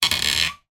wood squeak creak; foley; zapsplat

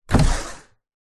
household window modern slide up open

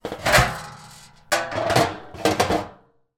household bin metal lid off then on; sound effects one

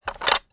toy airplane door open

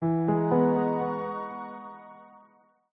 multimedia alert warm piano pads

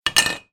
metal pole small down on surface; zapsplat

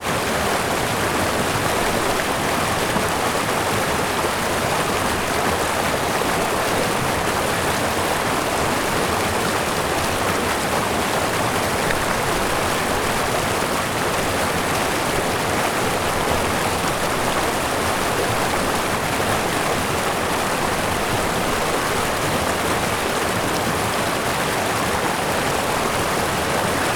river fast flowing pyrenees france

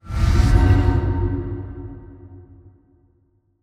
Cinematic Transition; soundbits

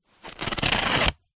paper sheet thick slice cut knife

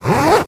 zipper; foley

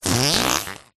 cartoon fart

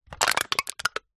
food drink soda can crush hand

